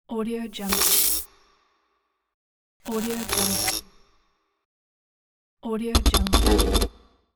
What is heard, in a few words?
circuit neon lamp